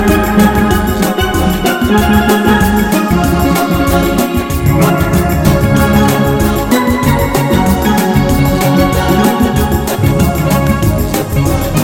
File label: Hot Stepping Song